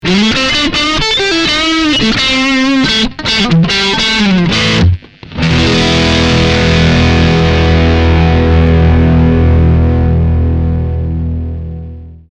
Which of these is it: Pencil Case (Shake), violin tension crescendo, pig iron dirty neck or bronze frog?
pig iron dirty neck